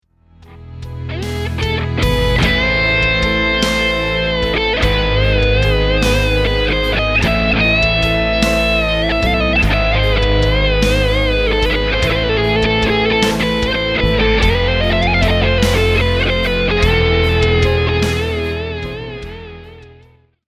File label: hungarian minor